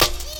snare; Breaks N Beats Sound Kit

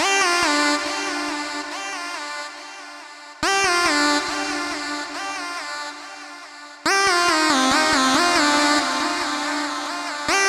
Main Lead WET 140BPM, G minor; Melody & Synth; Vengeance Samples; Space Of Time Kit

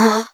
Vocal Oneshots; Vengeance Samples; Vocals

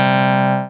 Synth Shot; Vengeance Samples

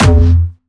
F2, Jumpstyle Kick; vengeance essential club sounds-3; VEC3 Bassdrums

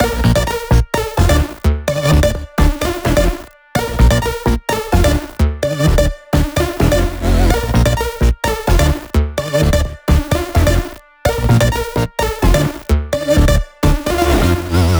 128BPM Dubwise Melody; VFH1 128BPM Dubwise Kit

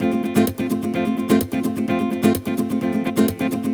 Nylon Guitar F maj, 128BPM; Vengeance Samples; VEH3 Nylon Guitar Kit 1

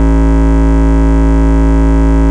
Bass Root A

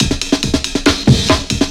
Fill 140 BPM

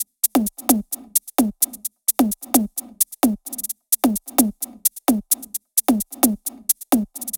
130BPM Kit; VFH1 130BPM Tibet Kit

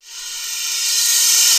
Reverse Crash, Cymbals; Vengeance Samples

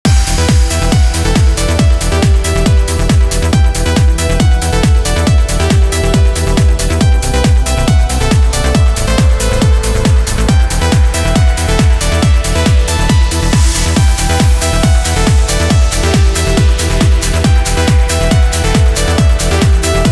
Complete Mix 138BPM, Eminor; Vengeance Samples; Freefall Kit

